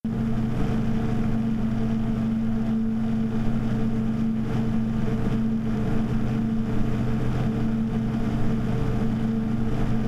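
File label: Blacknoise Max RPM, fan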